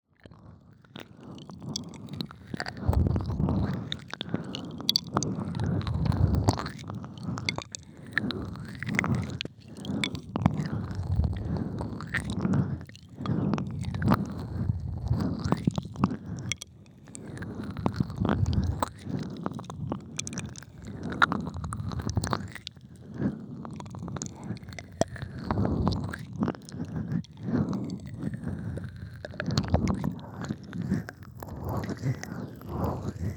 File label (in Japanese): 耳舐め, ASMR; ゴッドタン両耳無限ループ！【極・耳舐め】LV.4